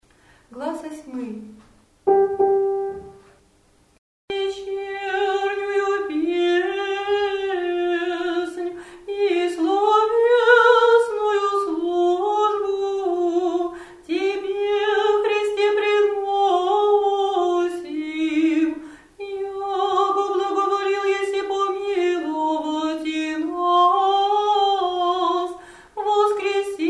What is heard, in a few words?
glas 8, church singing